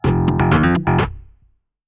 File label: jingle